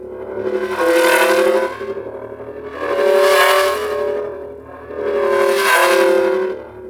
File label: Hologram Loop; Ambience; Props; Corpus Ship